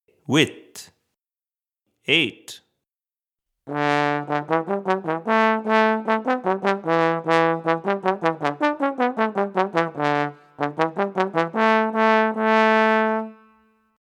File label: Trombone